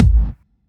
Kick, Drum Hits

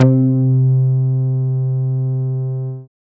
fat bass; Masterbits; Dance Trax

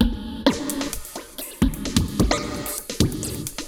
Moog Phaser HR-16B (130BPM), cyborg beats; musicradar

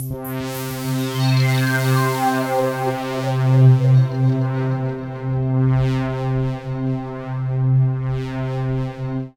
synth, Synth Atmos (pad); ambient; Chillout

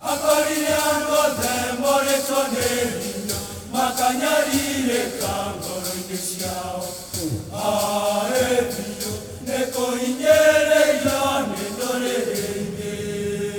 Heart of Africa; Spectrasonics; Heart of Africa 2

